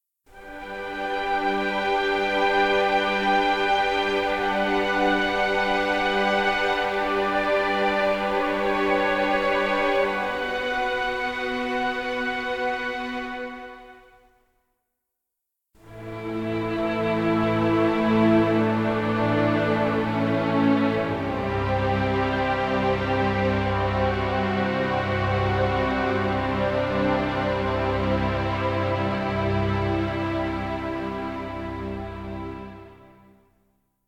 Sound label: Mobile Home - Ambient, Strings Stem; PS4 PS5 Switch Windows Xbox One Xbox Series XS